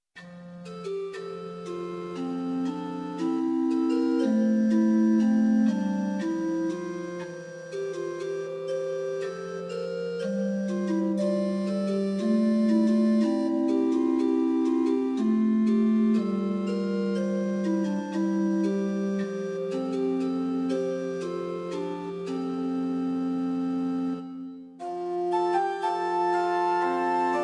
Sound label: Organ Temperament Meantone; hymn